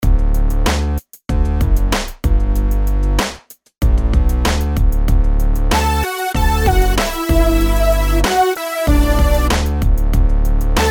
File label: melodies simple Dmaj; melody